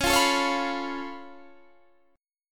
C sharp Augmented 7th C sharp down Guitar Standard, chord; C#+7; strummed; JGuitar